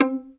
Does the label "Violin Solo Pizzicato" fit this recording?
Yes